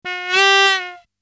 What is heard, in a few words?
Aliens Monsters; FXHome